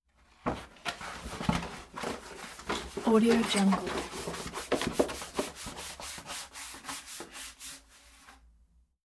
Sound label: rolling large sheet of paper